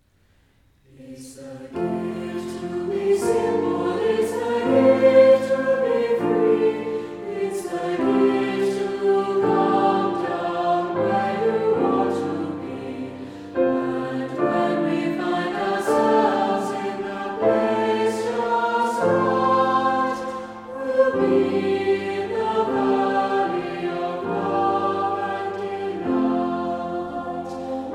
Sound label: simple gifts satb pno